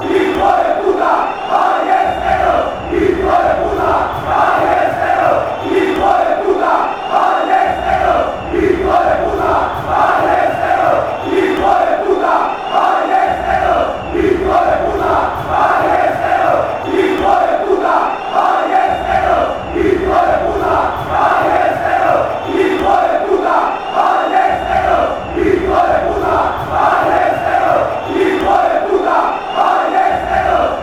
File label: son, fanchants